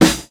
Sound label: clean steel snare drum d sharp key; snare drum samples